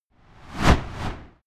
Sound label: torch flame whoosh